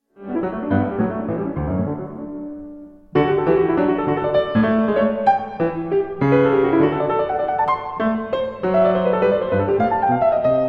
sonata for keyboard in g major 1st movement; Carl Philipp Emanuel Bach

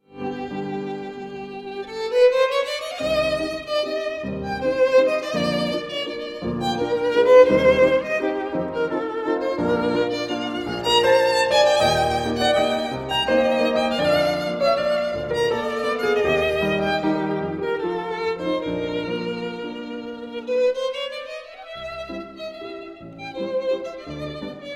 old viennese dance no 3 for violin and piano; Schön Rosmarin

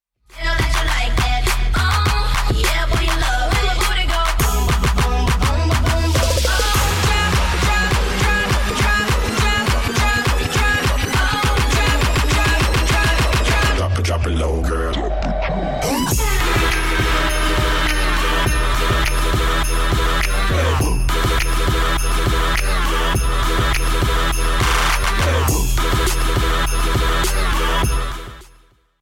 twerk remix

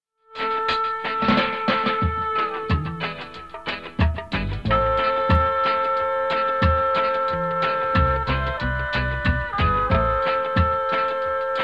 dub

